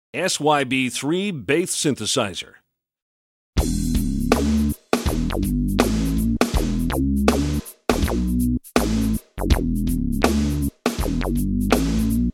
Bass; SYB-3 Tone Demo; BOSS SYB3

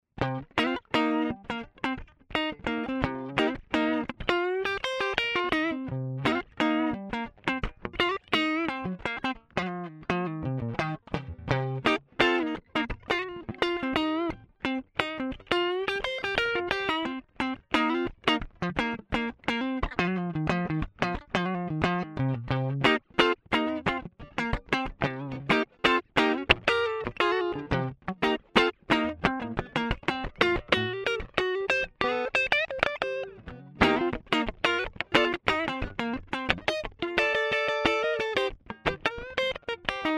FAT crunch

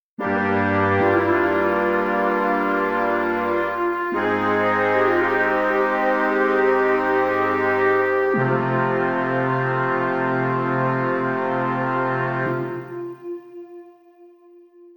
Fullblown Wind Riff

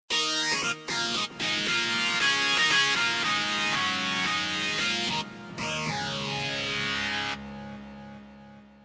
Arp Fuzz 5th FX

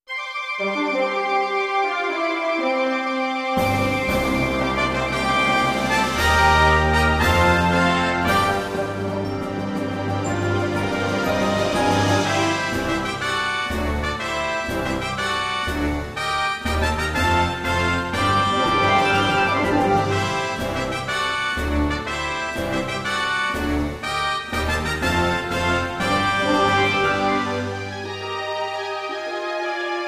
gm orchestra

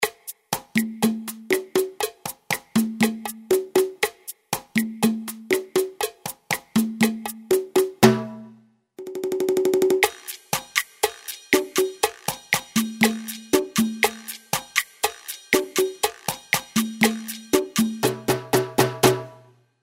Mambo, DR-770

